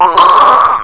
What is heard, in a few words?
squelch